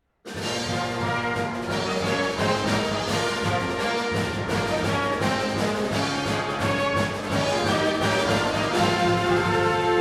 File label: Fanfare; Zero; 学生歌